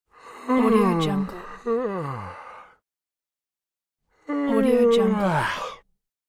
yawning

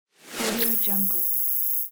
text swoosh; sound-design

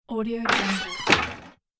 squeaky door close

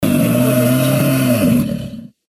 buffalo

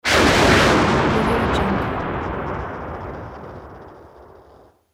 electrosplosion whoosh long out; hollywoodedge

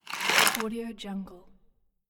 candy box tilt; soundjay

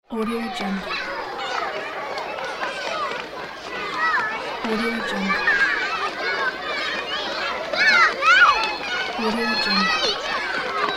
background crowd sounds

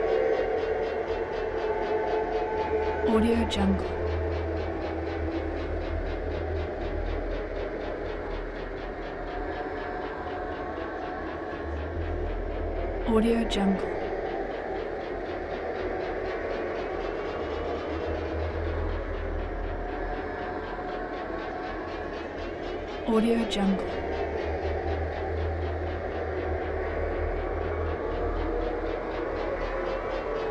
sci fi transformation synth tones build ups

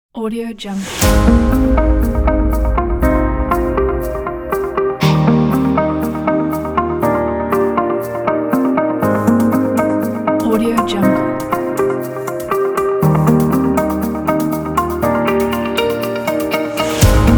inspiring waves

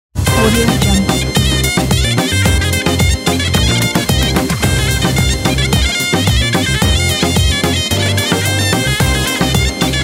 modern zurna

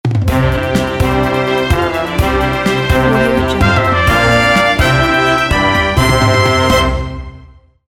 show intro logo

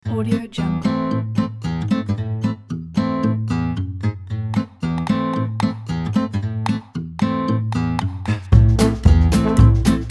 cinematic gypsy jazz

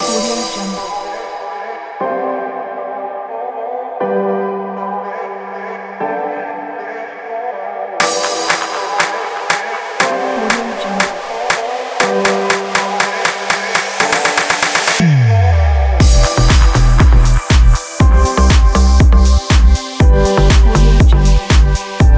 deephouse

